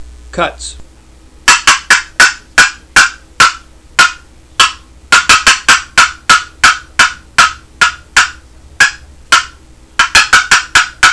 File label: cedar cutts, turkey; dixie